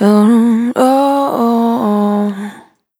Spin Dry, Single Voice Melodic Loops

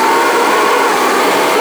A#4 WINDY; Northstar - The Wizard VOL-1; VERTIGO

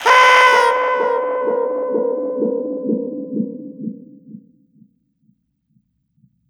TOY ECHO; USB Soundscan vol.27

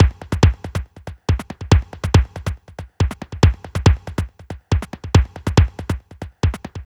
Accent Groove, Drums & Percussion, Electronica; Sonic Foundry (Sony Creative Software) - Electronic Point Blank

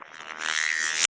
BOING; Distored Reality

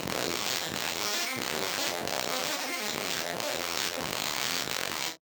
Casio, Glitched, malfunctioning synth

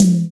TOM, KIT Dance Kit, Roland

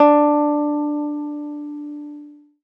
ZITHER; Best Service; Alpine Volksmusik

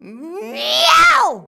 "nnnnyaooo", shouts; Best Service; Hyper Dance

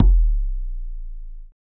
XTRA PERC

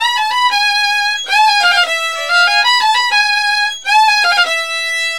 VIOL L, Balkanish Traditions; USB Soundscan vol.63; AKAI

